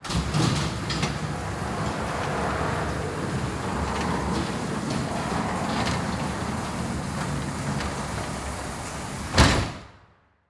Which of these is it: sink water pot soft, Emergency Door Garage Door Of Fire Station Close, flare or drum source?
Emergency Door Garage Door Of Fire Station Close